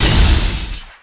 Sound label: Explo Small Electricity; Destruction